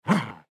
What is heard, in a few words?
Dog Akita Growl, Dog Akita Growl Short; Animal; Dogs; Blastwave